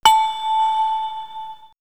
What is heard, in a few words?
Swirls Bell, pads; X-Static Goldmine